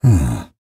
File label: Nonverbal Think; Ornn